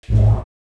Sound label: start, ships; Quantum Redshift; kukai